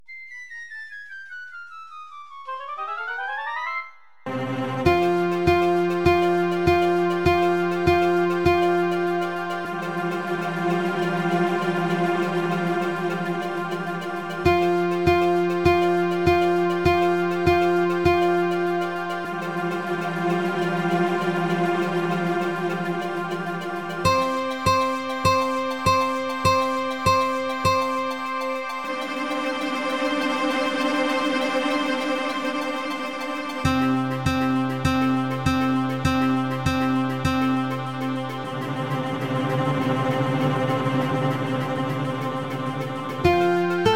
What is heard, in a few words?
Buzzing Bees accomp